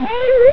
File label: houndeye pain; hl2